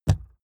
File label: wood impact hollow large